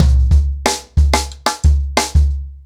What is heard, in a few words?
90BPM, ACOUSTIC DRUMS